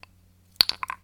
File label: coin; night market